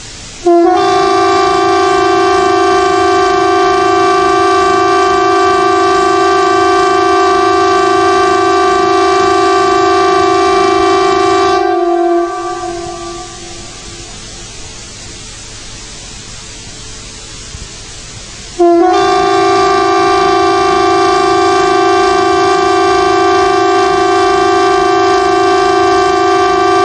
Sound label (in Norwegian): Alarm Viktig melding